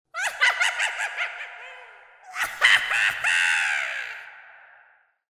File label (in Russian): zvuk vedmy vysoko v nebe; Для Хэллоуина; смеха ведьмы; Коварный злой смех старой ведьмы, забавный смех